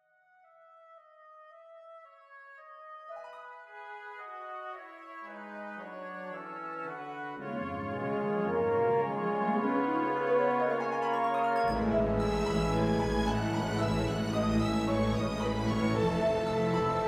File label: harp serenade, For four harps and orchestra